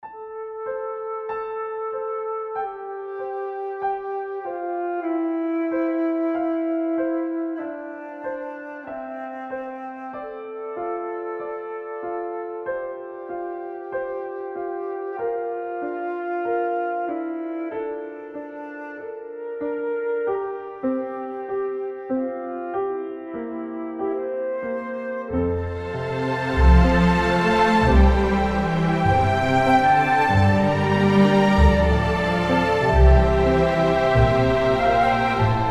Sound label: Adagio for Piano Winds and String